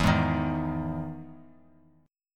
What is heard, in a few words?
E Major 7th E down; EM7 strummed; JGuitar